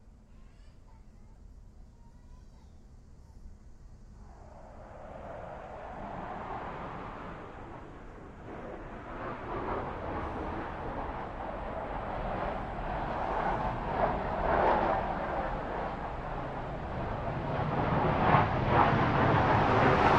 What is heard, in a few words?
jet flyover; Eric-Leonardson